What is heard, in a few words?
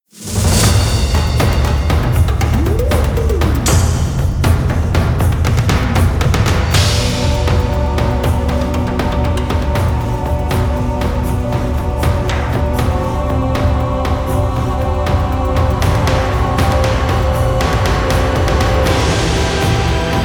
Elevator Music